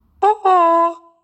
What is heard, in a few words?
hooo; Wizards unite; meloboom